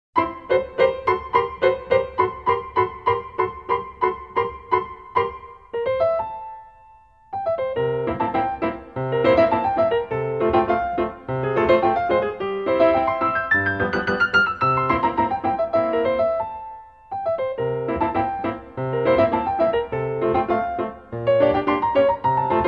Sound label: piano; Chiquinha Gonzaga; Atraente